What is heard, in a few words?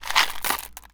Ammo Pickup; zps